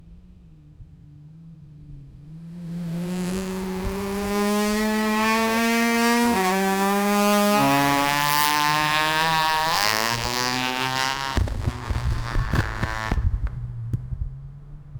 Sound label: accelerating sports bike